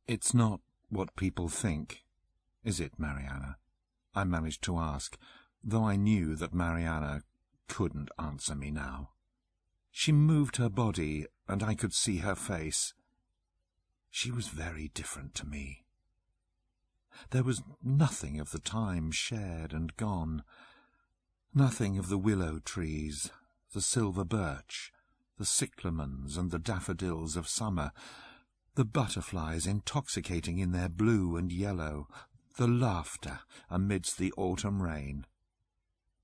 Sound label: Voices She Bird Song